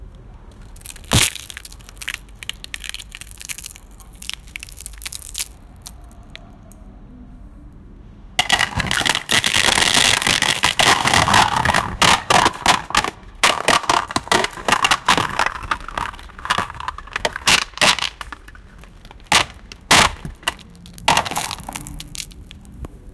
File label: giantess crushing things